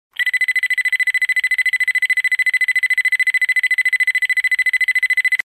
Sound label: Beeping alert